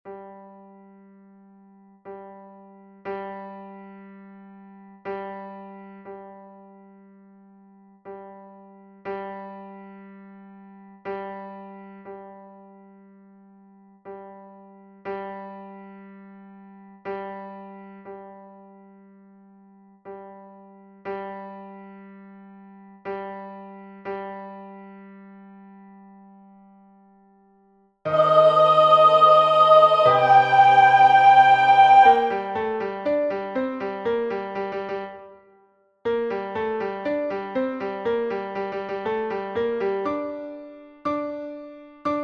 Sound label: Soprano; Bars 197 - 218